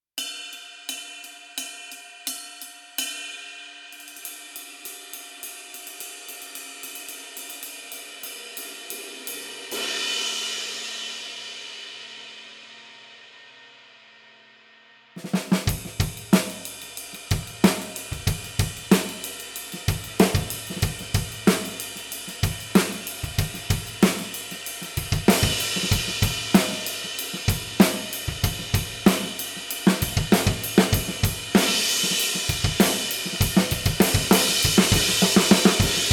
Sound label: 23 Traditional Medium Ride